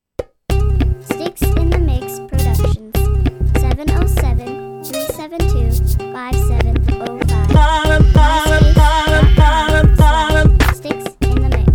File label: clubber